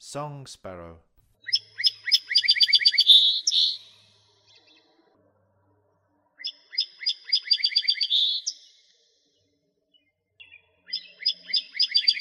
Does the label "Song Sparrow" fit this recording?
Yes